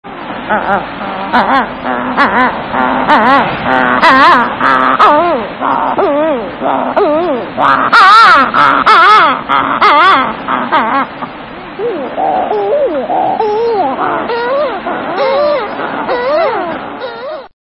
Sooty Shearwater

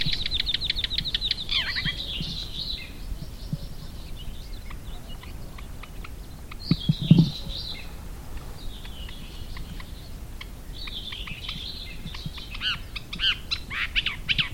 Thick billed Warbler

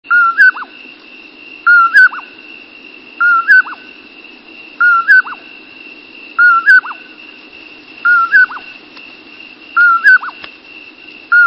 Common Poorwill, songs

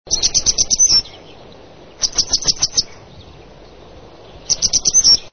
Gray Wagtail

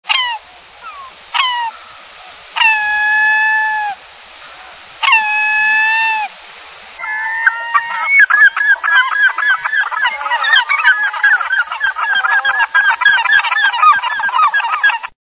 Herring Gull, songs

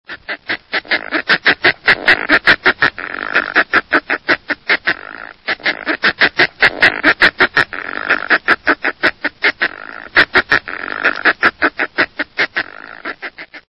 Northern Fulmar, songs

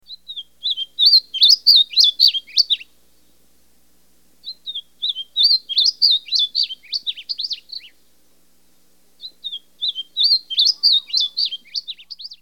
Gray crowned Yellowthroat

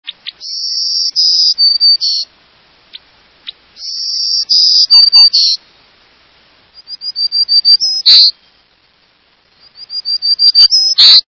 Black throated Green Warbler

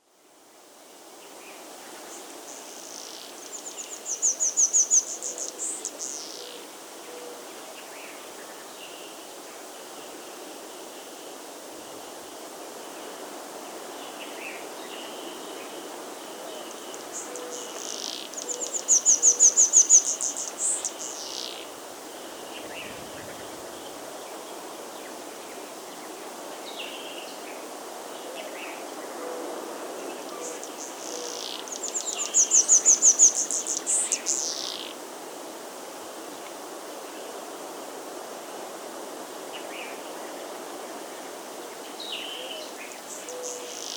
Bananaquit, songs

